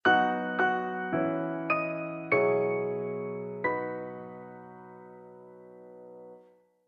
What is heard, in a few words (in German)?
Grundton Piano; Die kurze Variante auch als Klingelton oder SMS-Signal geeignet; Helmholtz-Soundlogo und -Klingelton